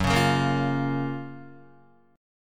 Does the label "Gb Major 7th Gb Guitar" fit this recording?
Yes